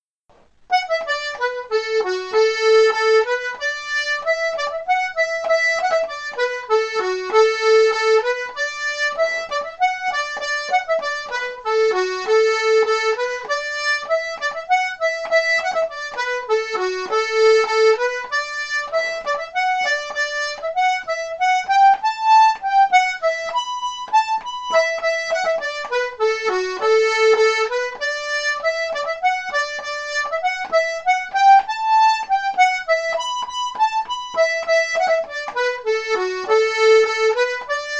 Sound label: accordion; rose tree